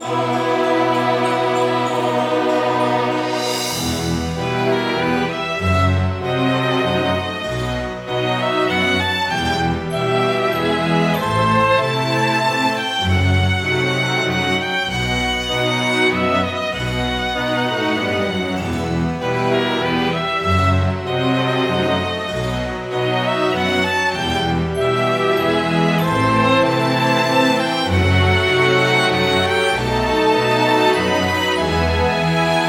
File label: Bgm town church